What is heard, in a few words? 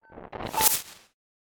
whoosh electronic short beep; sonniss gdc